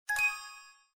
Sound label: click, ringtone